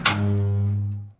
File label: metal door open